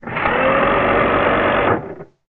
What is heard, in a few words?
Servo; blastmorph pinball; xbox series xs